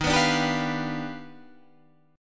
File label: Eb Augmented Major 9th; strummed; 11,14,12,12,12,13